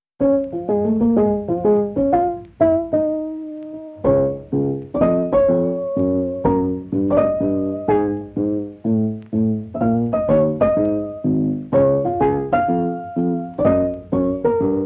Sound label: Vintage Piano, ragtime